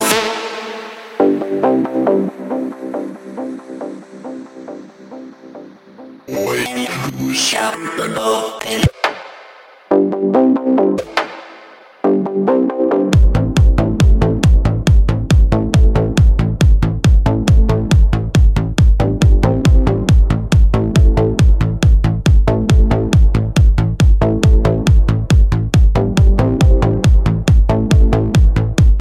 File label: Trance mix